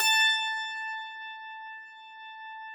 Harpsichord +ped, A3; Classical Prepared Pianos; Best Service ProSamples vol.53; Total Piano

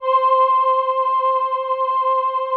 VP-330, String Machines

